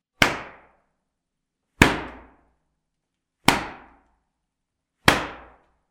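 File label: whipping sound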